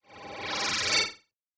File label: Laser Charge Up; SoundsCrate